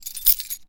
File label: Keys Pickup; zps